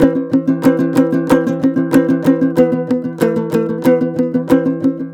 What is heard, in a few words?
TOPSHUR; Soundscan vol.60; Asian Traditions; AKAI